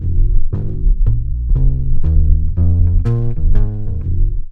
WALK BS; E-Lab; X Static Goldmine 2; akai

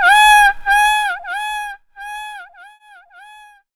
SAX RIF; E-Lab; X Static Goldmine 2; akai; SAX RIFF1

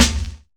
SNARE; E-Lab; X Static Goldmine 2; akai

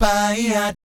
BAYEEAT, Vocal Planet Jazz Fx; Spectrasonics

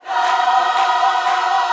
CIR JOY, Vocal Planet Gospel; Spectrasonics; Vocal Planet Vol.1 Gospel

